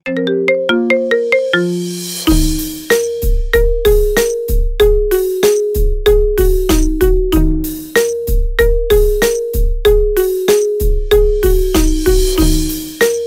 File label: marimba remix